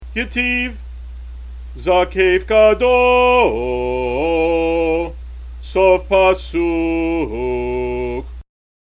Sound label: haftarah yetiv